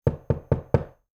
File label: knocking